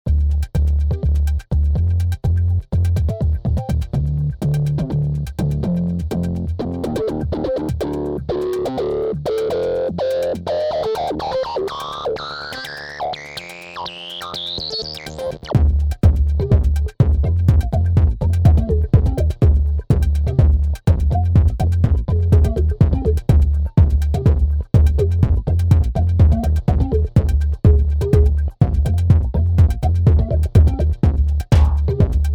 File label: Behringer Kobol Distort It